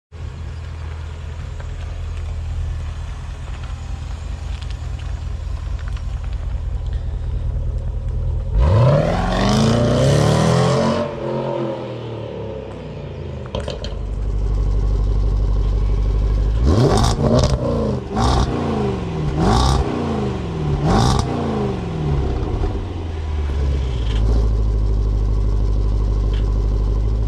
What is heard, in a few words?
Ranger exhaust